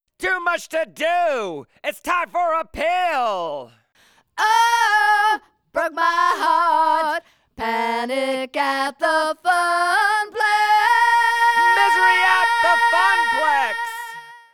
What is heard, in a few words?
Vocals